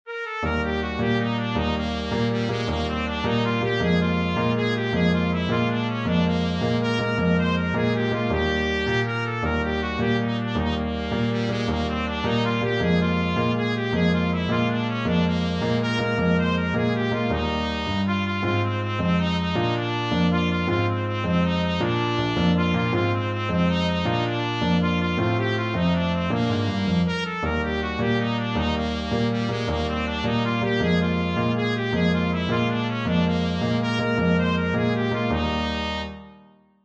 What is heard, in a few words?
trumpet